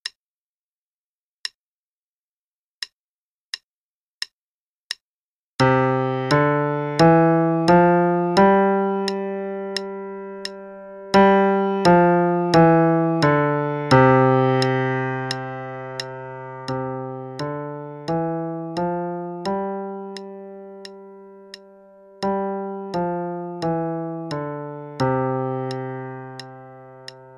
C Scale, qn=88; Faber and Faber; Primer, Book A; My L.H. C Scale; student part

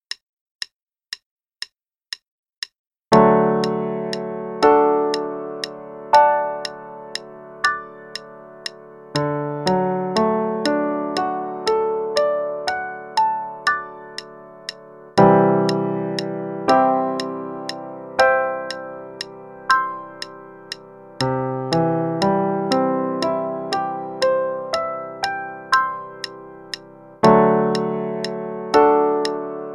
D Chords, qn=120; Level 2A - 2nd Ed; Cheers for D Chords

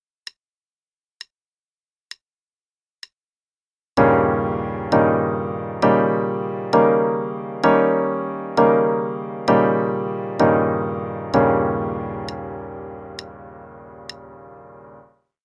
V7 Chords in Ab Major (qn=66); CM Level 6

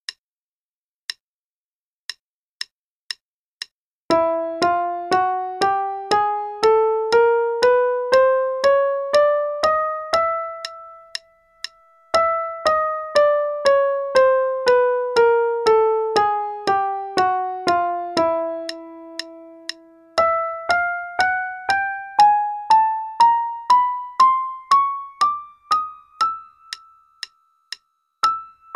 The Chromatic Scale (RH 2x, qn=120); Faber; Level 3A, 2nd Ed p.48

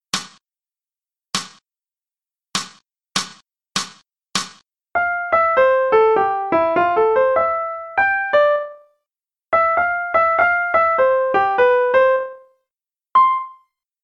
Rhythms, qn=100

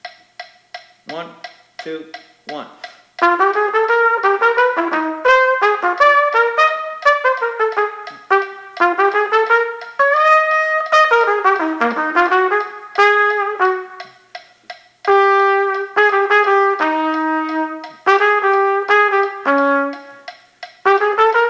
Play-along, qn=172, Trumpet; click; lessons Trumpet

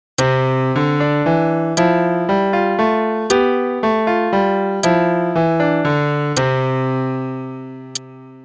polyrhythm for LH RH; qn=40; CM Level 6 Tech Req

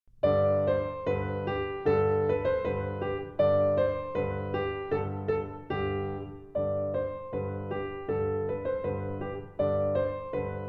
Bagpipe; Keith Snell Level 00 Baroque Classical

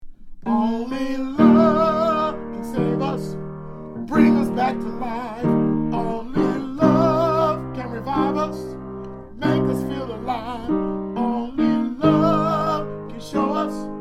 Tenor

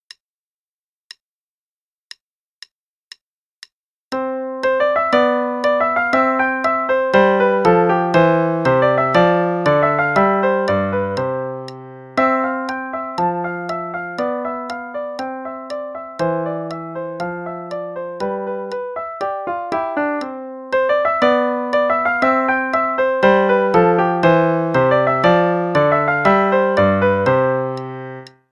Sonatina in C Major, qn=120; Duncombe

